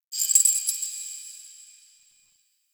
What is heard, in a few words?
Fortress Chains Small; Ambience; Warframe; Grineer Fortress